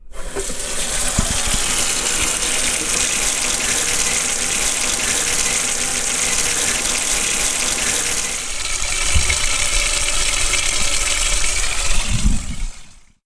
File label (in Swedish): vattenkran som är på